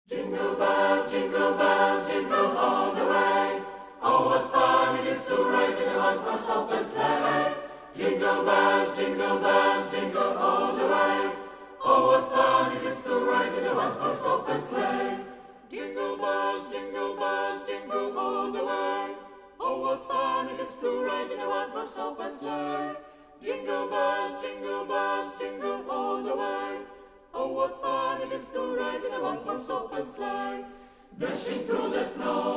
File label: Jingle Bells, chor